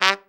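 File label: BRA TRUMP, trumpet; Best Service Gigapack 1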